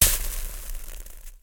Liquid Burnt; Puddle Plus

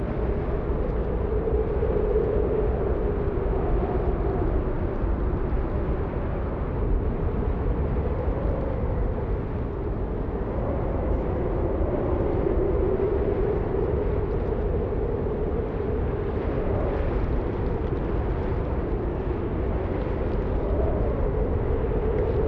Ambience Airfortress

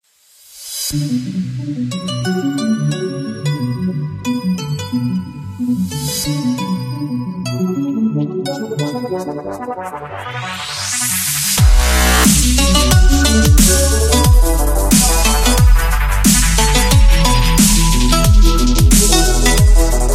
Haunted; dashy square

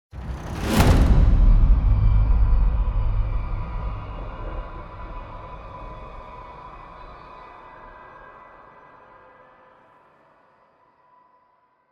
Transition To Combat

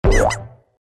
Rat Stomped

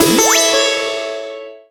Boss Hit

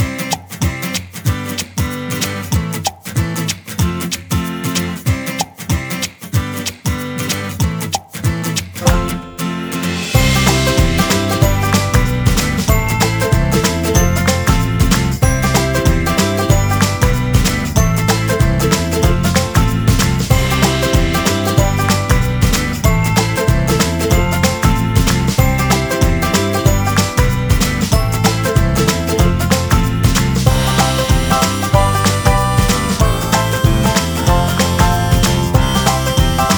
Upbeat; Meow Motors

